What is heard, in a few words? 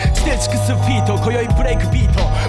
breakbeat Volume